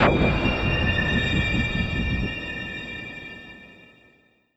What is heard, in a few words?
se horror; ＳＥ ドゥキコーン; 不穏 ジングル・サウンドロゴ スタート・ゲームオーバー; ホラーボイス・声ネタ・効果音・ＢＧＭ